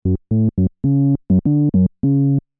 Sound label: Filtered